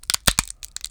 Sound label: ice creak; ponponsounds; cakewalk